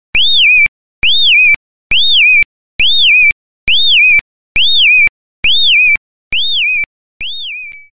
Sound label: Birdy; PM Flummi